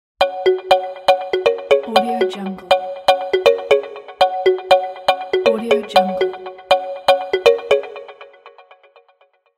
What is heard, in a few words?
kalimba ringtone